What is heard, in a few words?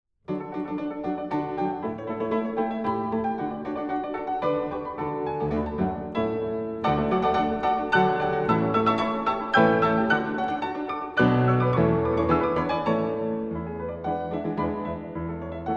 polonaisen nr. 4 in d major; sechs polonaisen